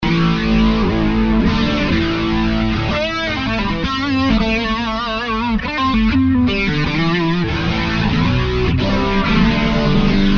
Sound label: space chorus stereo tri lag blues driver pod; echo park; line6; tonecore